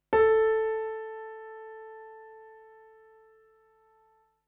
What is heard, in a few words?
tonic